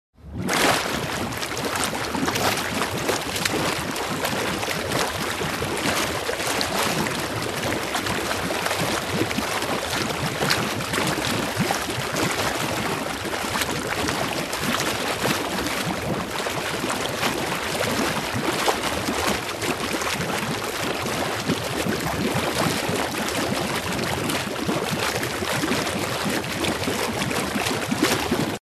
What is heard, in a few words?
Splashing in the water